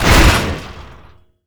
Tail Blade Damage; DCS

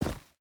footstep boots dirt jump land; Rust

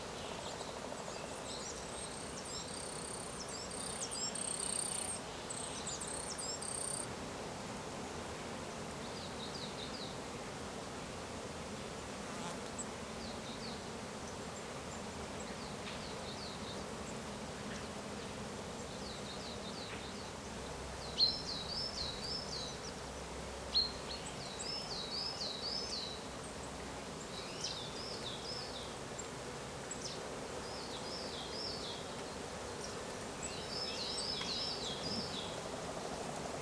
ambient forest; DCS

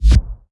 impacts; World of Tanks

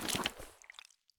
prone puddle; Escape from Tarkov

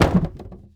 tool cupboard deploy; Rust

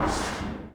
sheetmetal slip

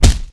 Hit Knight; Civ4 beyond sword

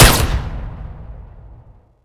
protogun 1shot cave

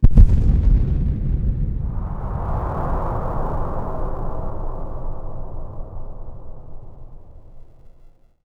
cave occasional

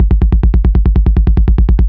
loop m1918 bass; guns tanks; War Thunder

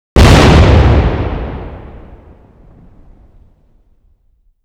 Inf Weapons; Red Orchestra 41-45

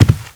foot carpet; Call of Duty Modern Warfare

